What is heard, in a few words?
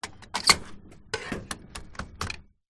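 Gas Pump Back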